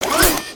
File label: Spider Boss Strafe Finish; creatures mechanical